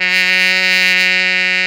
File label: F#3 C, BRASS; AKAI S-Series CD-ROM Sound Library VOL-8